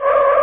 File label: game skid, racing; Zoom - Release 2; Active Software; lastlap